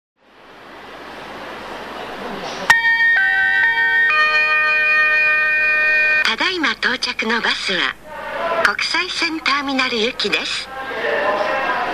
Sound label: haneda airport, bus stop; 羽田空港ターミナル間無料連絡バスのりば到着放送 (2); 羽田空港国内線ターミナルのターミナル間無料連絡バスのりばでは自動放送が導入されており、到着時には空港を意識した(?)４打音チャイムが流れます（第１ターミナルで収録）。